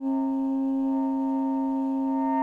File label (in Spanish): Multiarmonicos